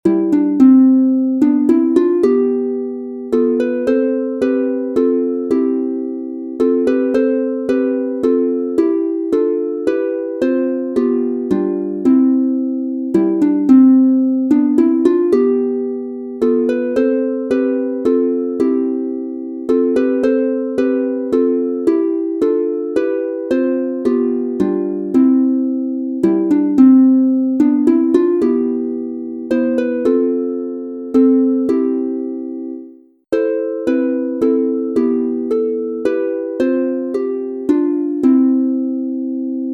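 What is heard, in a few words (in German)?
C Dur 3 stimmig, Harfe; The first Noel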